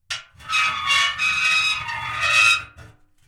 Screeching Metal Rub; Mechanical Wave; Torturing Metal

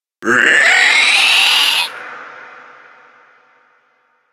Creature Screeches

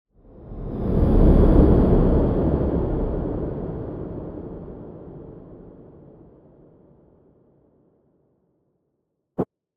Sci-Fi FlyBy; CB Sound Design; Exoplanets; Drones & Atmosphere Sound Effects

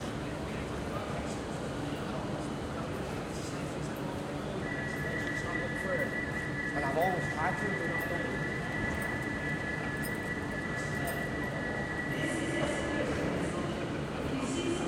Train Station Ambience; InMotionAudio

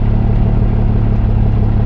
atv idle; hl2

